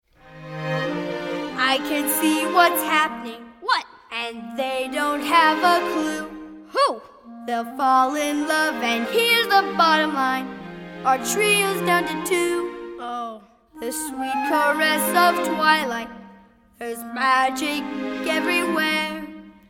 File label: Audition Vocals; audition materials; Disney's the Lion King Kids